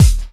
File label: KIT, Dance Mega Drums, HIP; Best Service